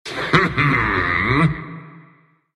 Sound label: grunts; Monkey crown; Dota 2